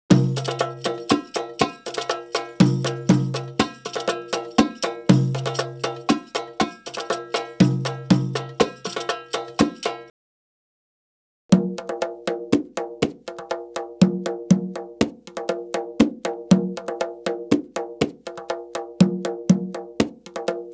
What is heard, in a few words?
Arabic Rhythms